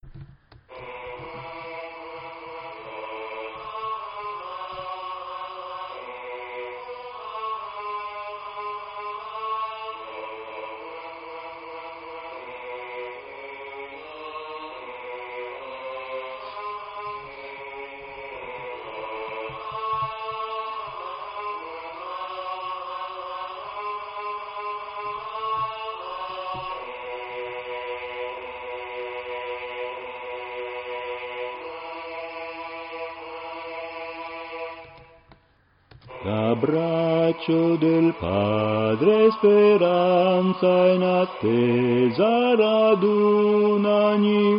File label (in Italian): Bassi Melodia e Voce; Coro; E cominciarono a far festa